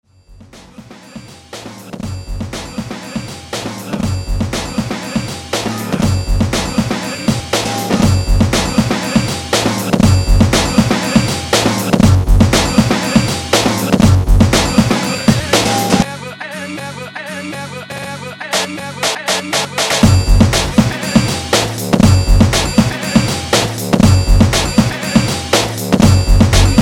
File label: instrumental